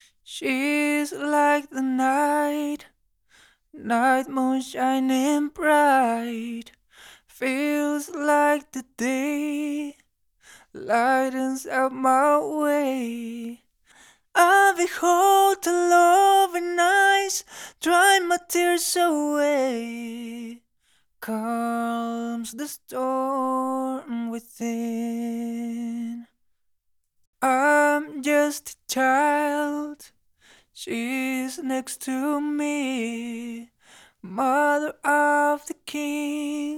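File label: Pop EDM Dance 138 A sharp M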